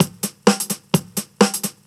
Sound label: FX Loop 128 BPM, House; Best Service; House Definition VOL-1